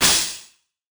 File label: crash, Cymbals; drumkits; fl studio; goodtakimu drumkit